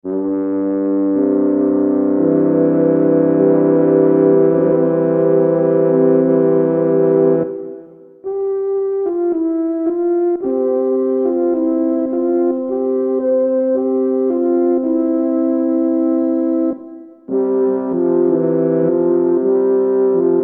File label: French Horn